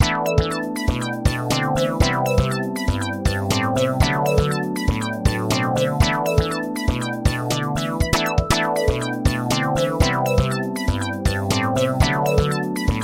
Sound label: roland mc-909 acid bass